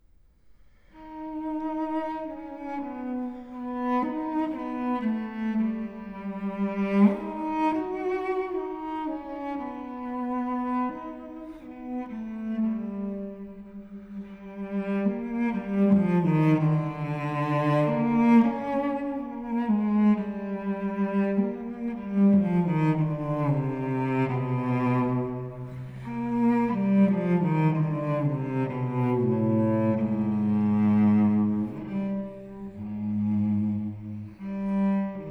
Suite for solo cello; Canto; Britten; Violoncello Solo

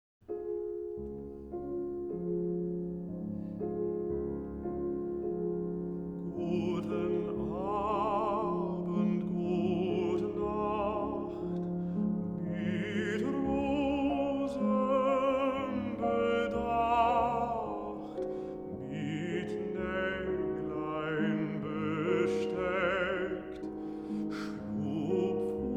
Wiegenlied (Lullaby)

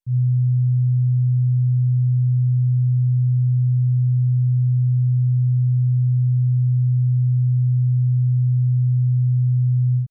125Hz

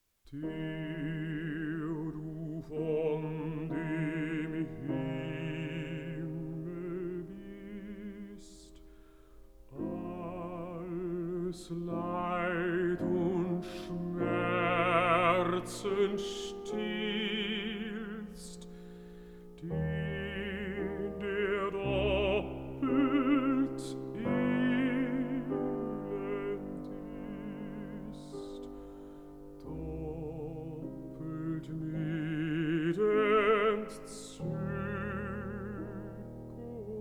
song for voice & piano

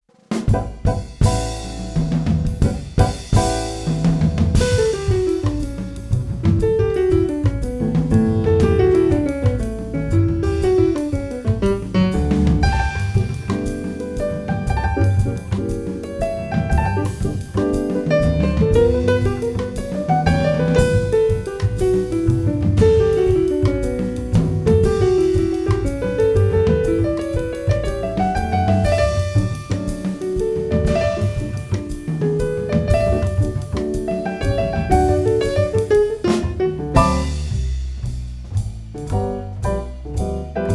Variations, for keyboard

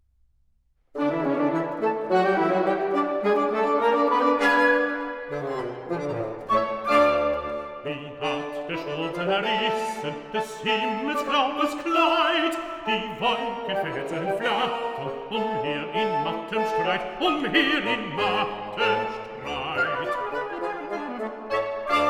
song cycle for voice & piano; Schubert; Winterreise (Chamber Version)

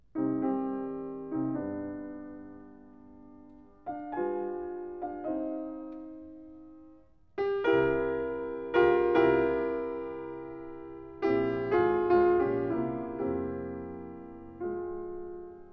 Variations and fugue for piano in E flat major

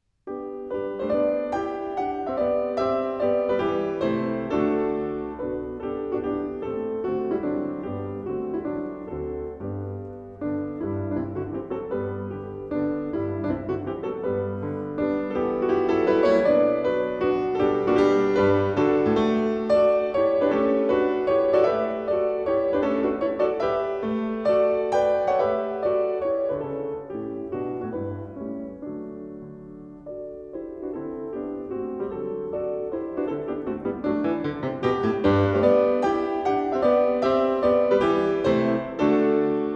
for piano Allegro non troppo; "Christmas Pieces"; Mendelssohn

